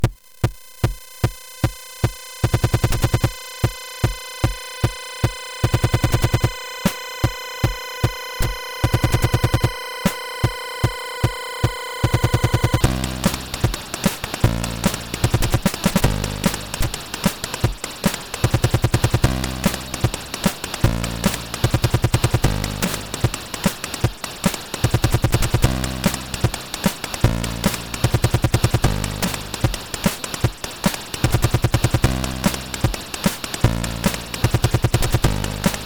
Solo, CSG8580R5

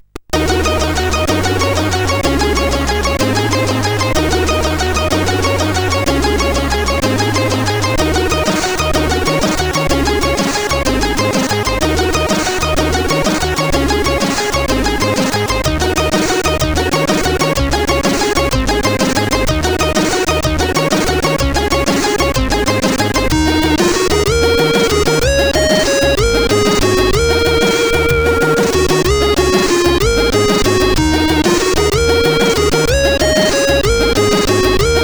intro, CSG8580R5; Dystopia 2

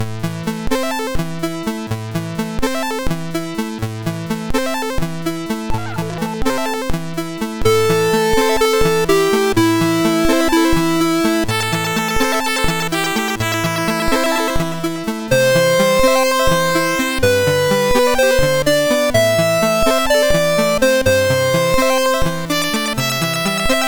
Pulsewave solo, sid MOS6581R2

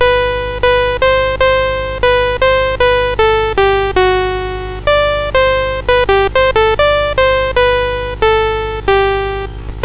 Guitar BASIC, CSG8580R5

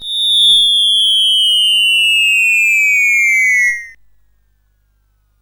Taipan sfx, CSG8580R5

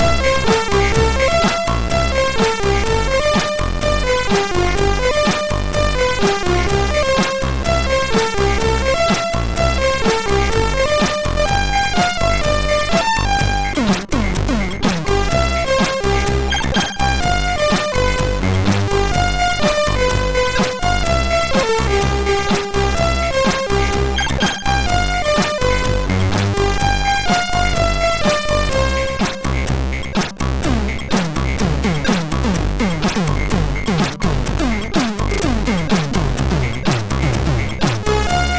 sid MOS6581R2; Ovide; hvsc; Digiblaster

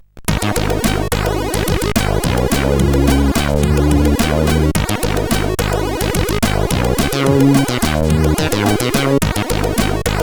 Note Music, CSG8580R5; Note Music 1

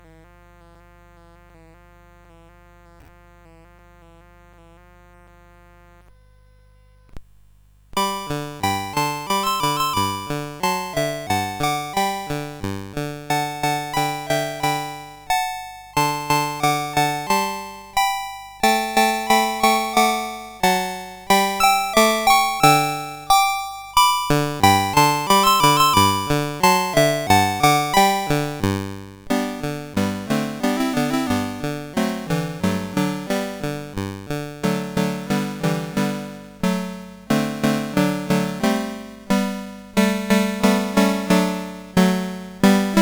Kling Gloeckchen, CSG8580R5

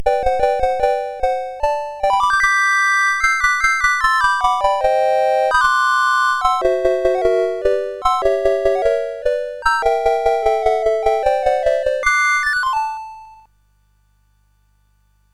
Season Jingles, CSG 8580; CSG8580R5

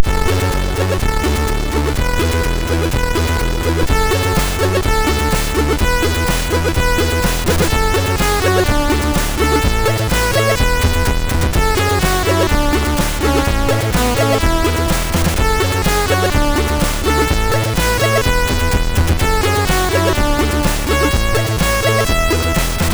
Funky Fun Remix, MOS6581R2

